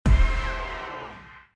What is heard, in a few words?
transfer stop; Back to 70s; wazdan